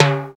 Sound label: RX5 LO TIM,RX-5; Hollywood Edge; The Big Bad Beatmachine